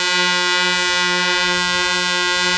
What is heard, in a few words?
truck horn daf euro6; vehicles; lwcars; horns